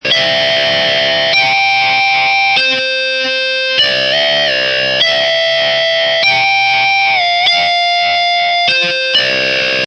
Electric Guitar Melody2 Loop Em 98Bpm, Electric Guitar Loop In E Minor At 98Bpm; Guitar Loops